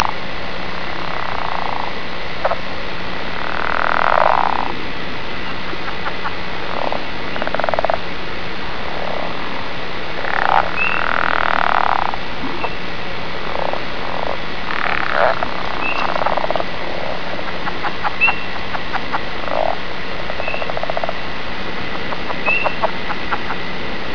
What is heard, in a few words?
leopard, frogs